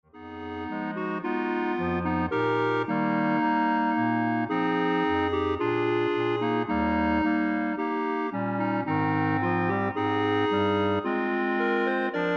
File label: Clarinet Ensemble